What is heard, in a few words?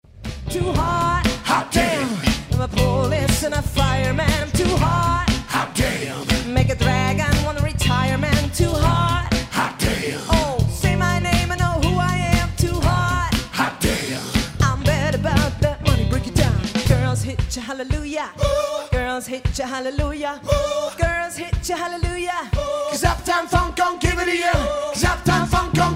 mix voc